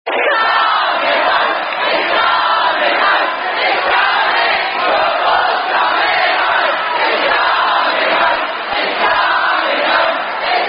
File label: Football Chants; A Modern Myth